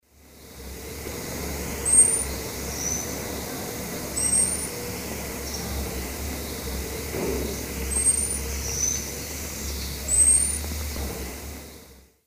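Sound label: Heliodoxa aurescens, calls of juvenile; S. America; Eastern Lowlands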